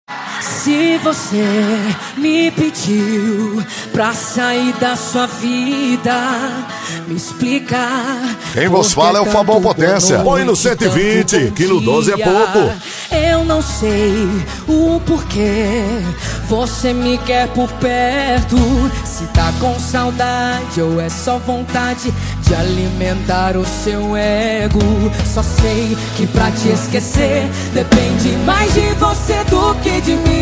sertanejo com locutores de rodeio

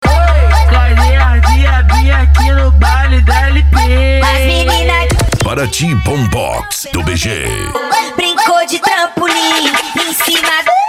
bombox funk

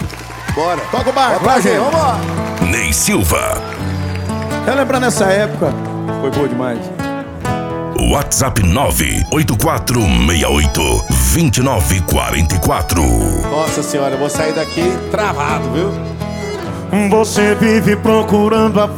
sertanejo

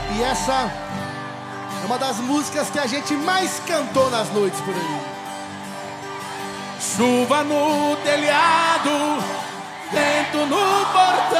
moda de viola; frequency mix

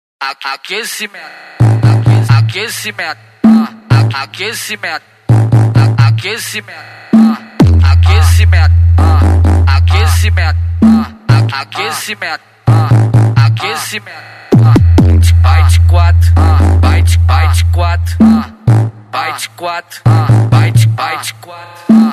funk no grave

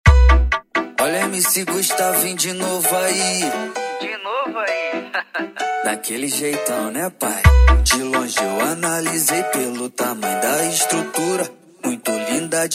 som car; reboque; douradina parana; ostenta som; equipe evolusom

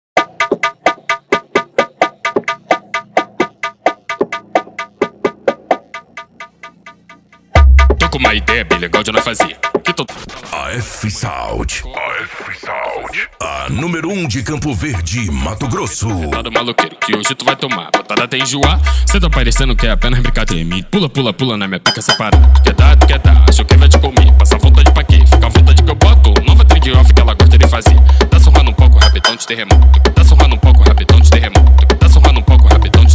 esp funk automotivo; afsound

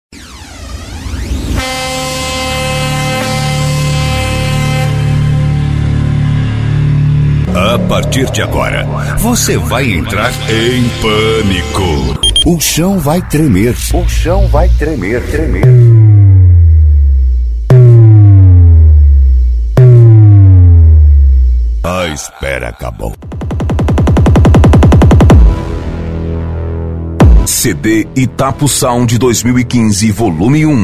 abertura, funk bass e pancadao; itaposaund 2015

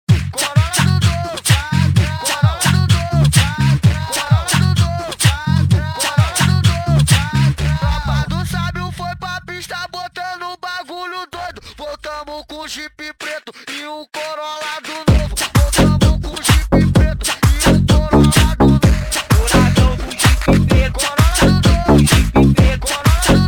pancadao funk

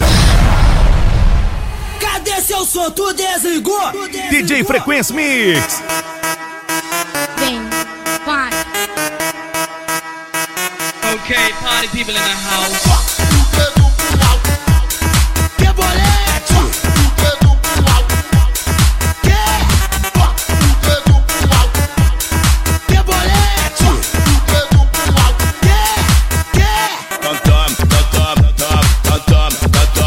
eletro funk retro

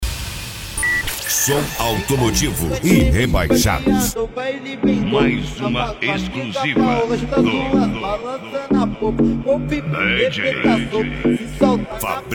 som automotivo; rebaixados

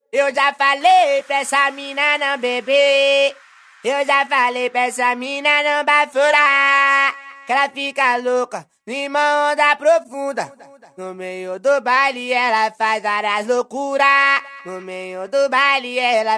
baile funk

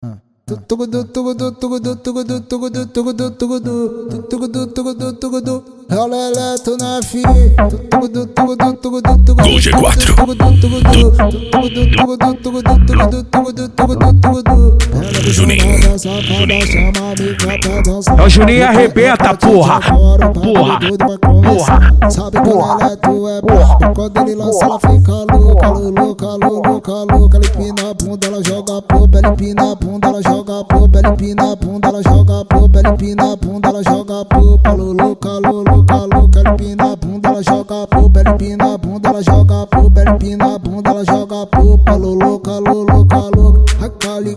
cd funk automotivo

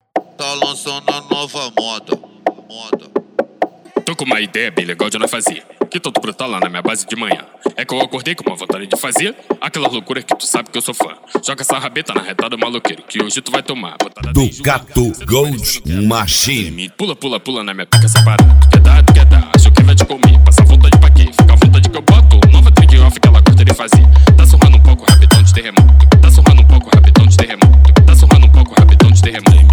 especial funk

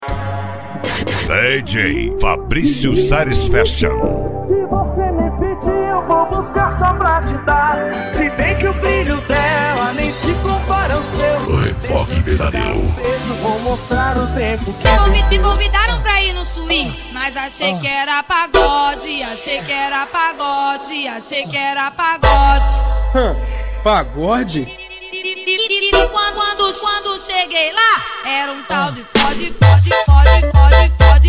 sound car

